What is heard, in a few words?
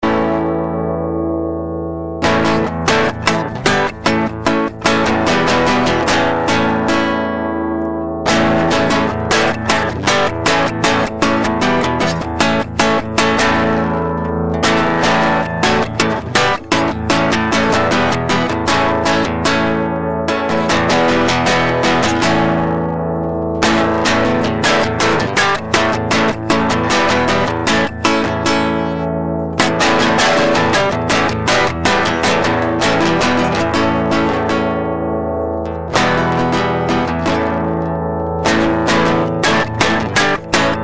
guitar